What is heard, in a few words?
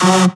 Synths F#; Vengeance Samples